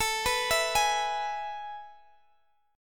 A Major 7th Suspended 2nd, Guitar, Standard; arpeggiated; capo 3; x,x,19,16,17,16

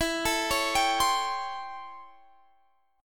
A 7th Sharp 9th

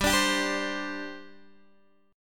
G Suspended 4th, Guitar, Open D

